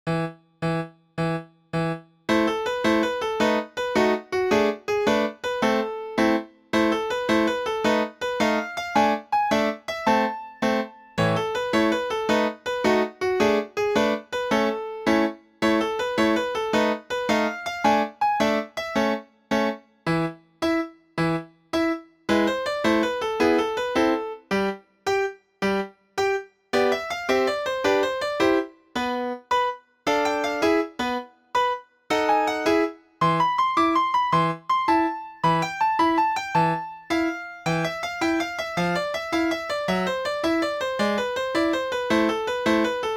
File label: Tarantella